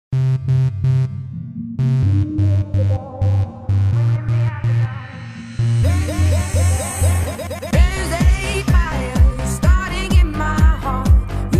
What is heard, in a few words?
Jazz